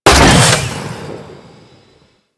Saw Blade Launcher Fire; Warframe

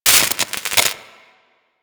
UI Crafting Spark B; Warframe

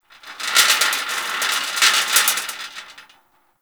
Gas City Rattle Metal; Ambience; Warframe; Corpus Gas City

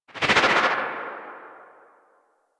Arch Wing Atmosphere Flyby Right, Vehicles; Movement; Warframe

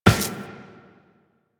Run Glass, Footsteps; Warframe; Tenno

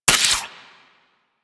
Garuda Crossbow Mech A; weapons

